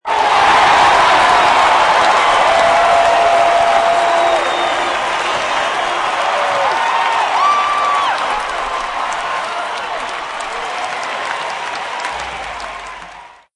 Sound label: Applause High; Monster Truck Destruction; Android